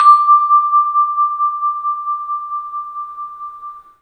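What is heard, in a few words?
tubular bell; 3D Sound; 3D Keys VOL-1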